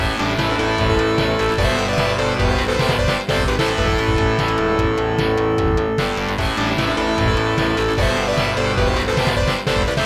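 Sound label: background music; Varth - Operation Thunderstorm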